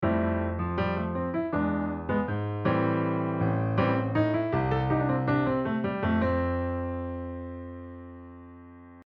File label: oshare rhythm